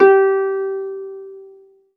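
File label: HARP A4 SUS; ILIO; Synclavier Strings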